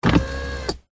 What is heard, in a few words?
vehicles car luxury electronic seat adjust motorised